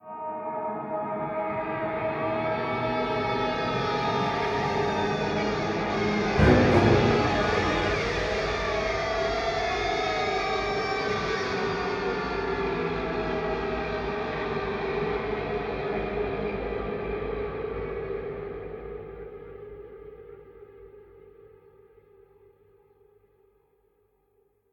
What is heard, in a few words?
Visit; Prison Architect